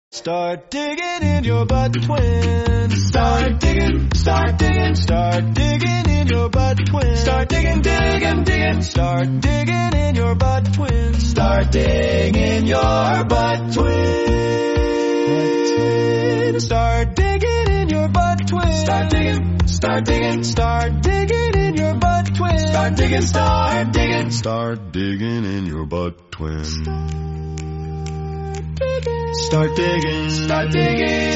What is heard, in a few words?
start digging song